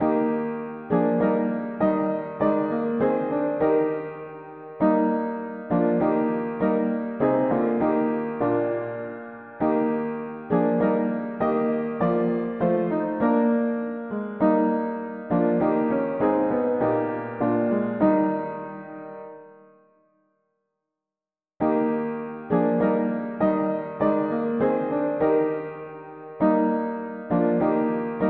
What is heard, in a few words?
mono piano; hymns